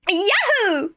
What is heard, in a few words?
yahoo; birdo; SM64 CO-OP